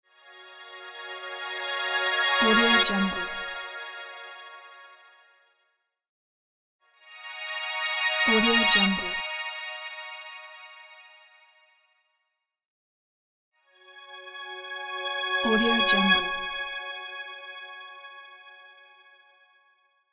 reverse sound

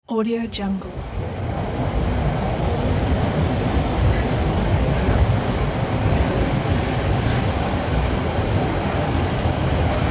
subway arriving at station

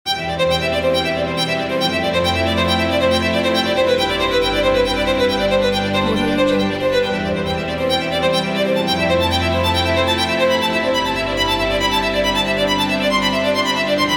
violin strings spot elegant

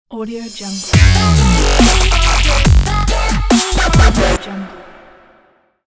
dubstep logo

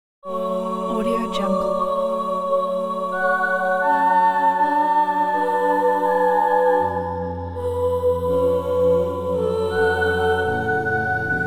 vocal choral adagio; mozart

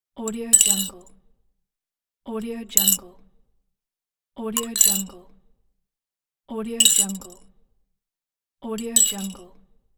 spoon drop in cup

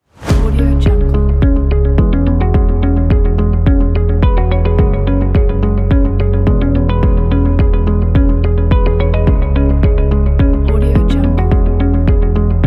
elegant background ambient

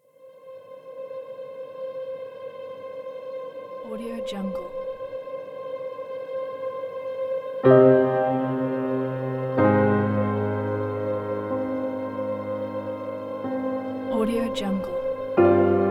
sad piano soundscape drama

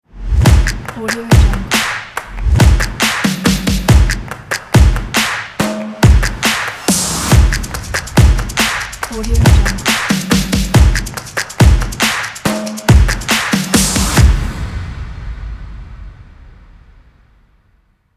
stomps claps logo intro

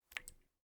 water drip into ceramic bowl pings

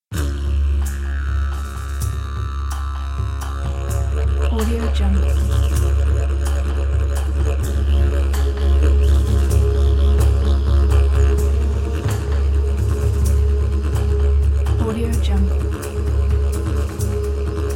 australian outback; purpleplanet

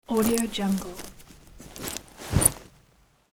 canvas knapsack unlatch and open

